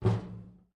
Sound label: creak